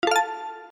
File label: enemy defeat